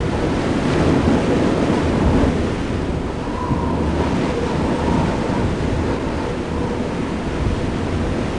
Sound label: wind loop; nimbus station